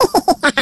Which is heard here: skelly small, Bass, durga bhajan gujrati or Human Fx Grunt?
skelly small